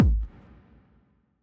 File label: Kick, retro house samples, Drum Hits